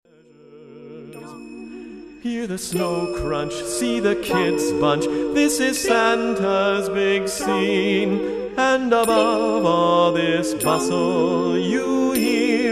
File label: Carolers; Minneapolis